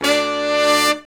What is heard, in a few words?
BRS SHRT, R&R Horns, Sect; Roland L-CDX-03